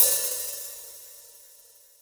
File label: Pop OHH, BossDR660 oh